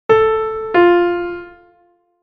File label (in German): Intervalle sukzessiv Beispiel